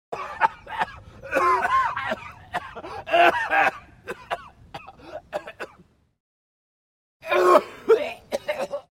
Coughing Fit Male Close Perspective

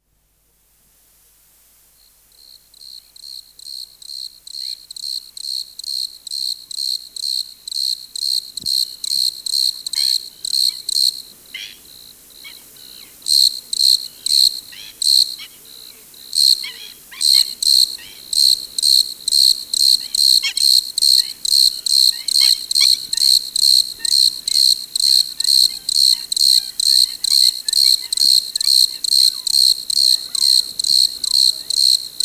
Chorus of Desert Crickets; Dr. Sound Effects; 210 Nature Sounds - 20 Hours of Relaxing Natural Ambiences for Meditation and Sleep

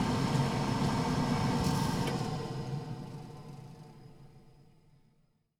gas heater; 1000fx

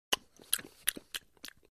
Human Lip Smacks; Hollywood Edge Foley Sound Library

